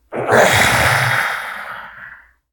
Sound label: pain, voice; garrysmod